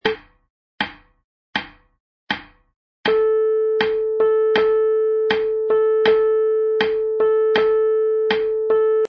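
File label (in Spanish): ligadura negra corcheas, piano midi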